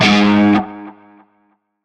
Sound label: Shawzin Oct One G Sharp; Music; Warframe; Narmer Shawzin